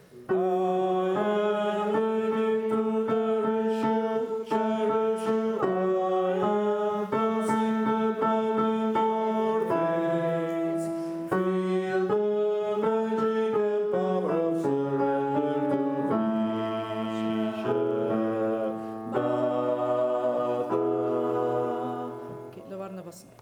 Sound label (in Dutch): bas